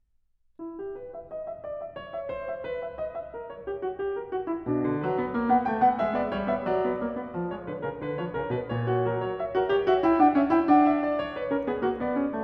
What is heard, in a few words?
Fugue No. 10 in E minor, Well-Tempered Clavier; BWV 855; Bach; Book 1